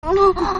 Moan

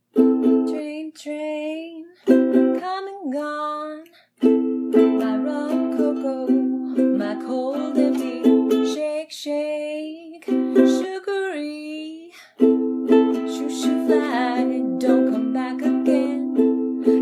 singing, badly playing ukuele; F4A

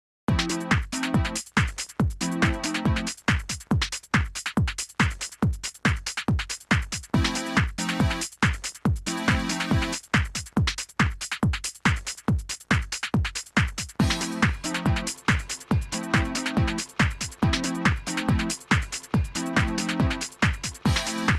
World Famous Bassline Brothers

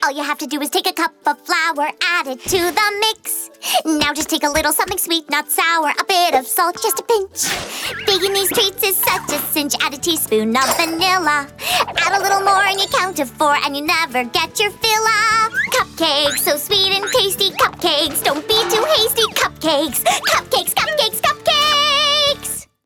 Cupcakes (Vocals); My Little Pony OST Repository; Season 1; Bonus Music

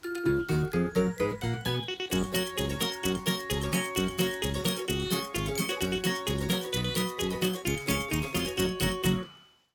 instrumental; My Little Pony OST Repository; Season 2; Bonus Music

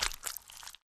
slosh; Lewd SFX

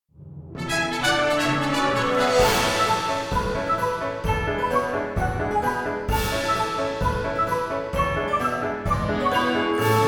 Bagpipe; Victory; Music from Pokemon Re enacted by Ponies

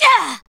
Grunt; Lewd SFX

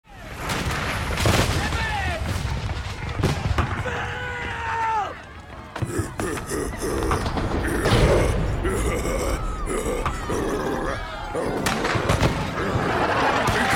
Metal

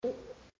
Swallow; Lewd SFX

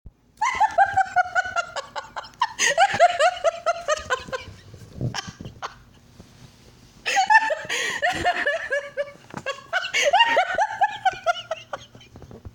Laughing

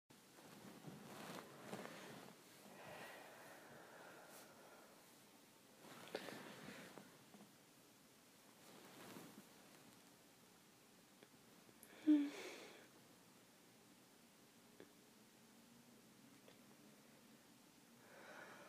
Morning Noises

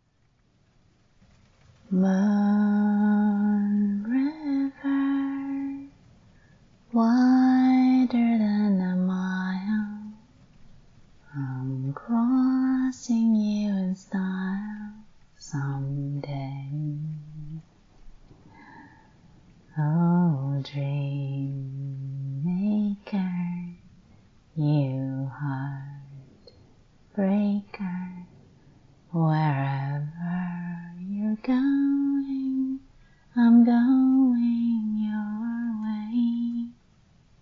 cover a cappella

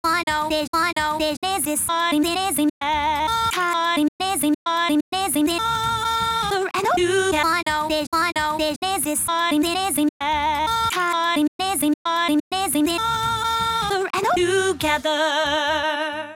vocals, 130BPM; Pony Fan Music Remix Stems

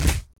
Smack; Lewd SFX

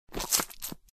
Suck; Lewd SFX

